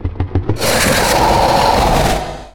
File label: hydra alert; hl2